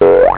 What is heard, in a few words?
buing; rocks n diamonds